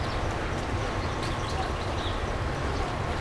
ambi out; Mafie 1

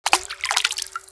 water; Mafie 1

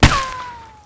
hit path; Mafie 1

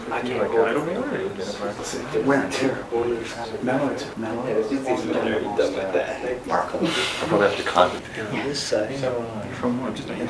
whimper; Mafie 1

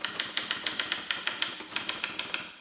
writer; Mafie 1

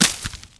bush; Mafie 1

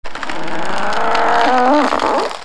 tree; Mafie 1

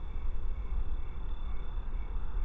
ambi in; Mafie 1

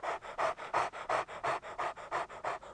dog; Mafie 1

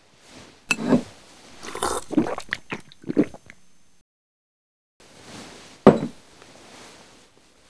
drink; Mafie 1

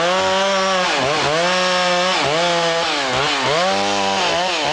out saw; Mafie 1

